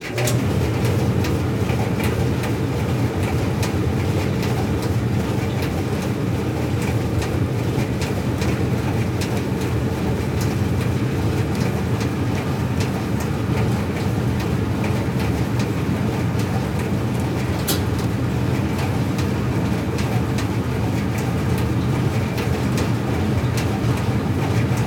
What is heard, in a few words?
dryer; Random Sounds